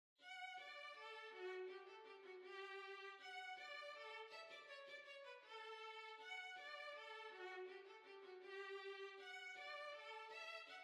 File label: Bang Up Hornpipe Violin